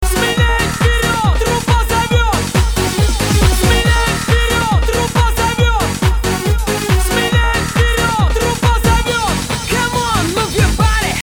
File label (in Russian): truba